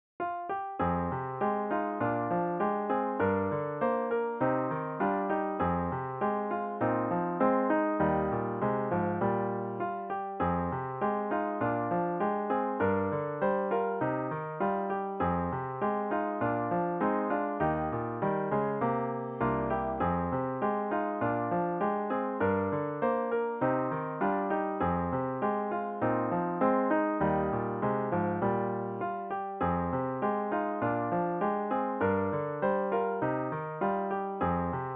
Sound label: like a child piano with Intro; worship service